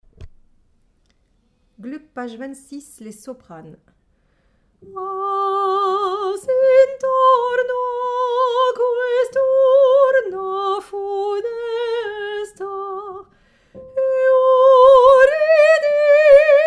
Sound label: Soprano